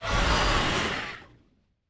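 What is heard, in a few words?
Earth Servant death, monster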